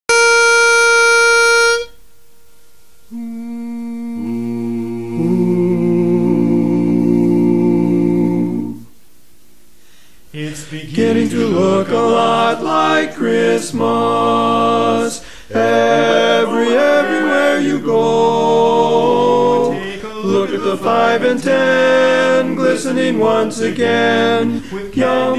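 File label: Baritone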